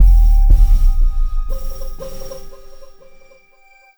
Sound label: Synth Bass; Basses